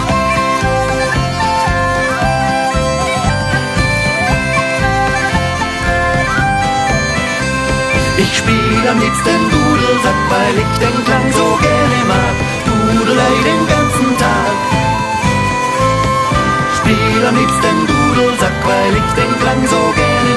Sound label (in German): Dudelsack